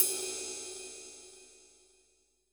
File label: RIDE; JV-1080 DRMS; EdgeSounds; Drum Mashines VOL-1